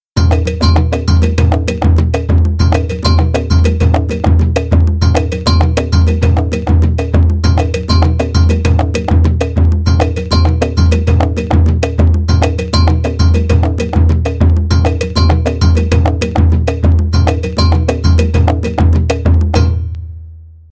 Samba; POUR OUE

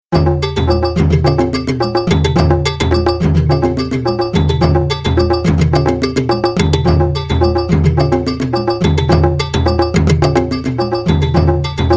Rumba; YESA

